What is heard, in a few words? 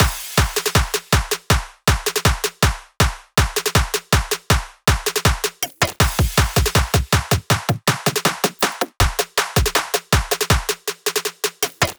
Buildup Drums 160 BPM; Future Bass; Cymatics; Future Bass Starter Pack